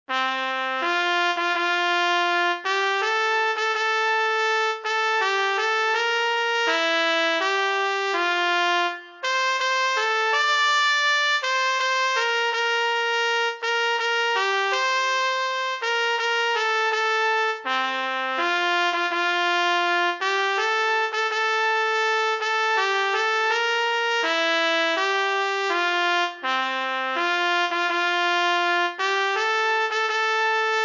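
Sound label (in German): solo O Tannenbaum Trompete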